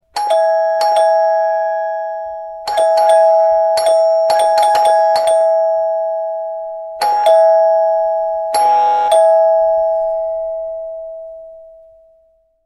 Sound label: Ringtone